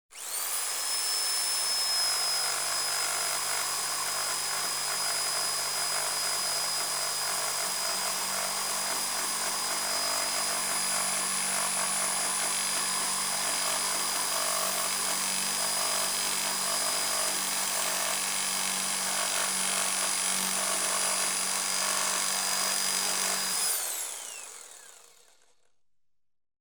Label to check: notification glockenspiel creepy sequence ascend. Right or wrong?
Wrong